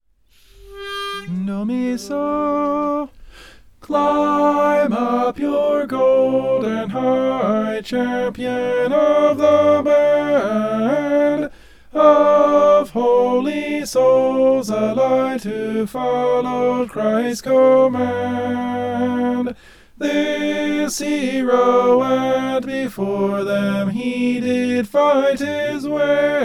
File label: tenor